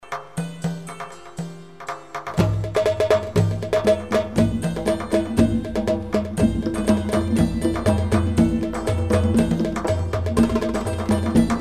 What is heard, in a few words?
electric drum